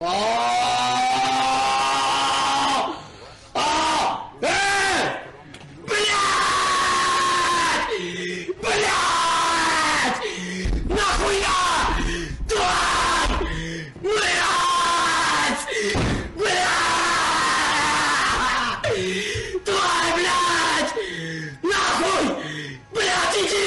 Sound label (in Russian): krik zhestkii